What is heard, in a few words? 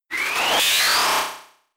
Echoed Voice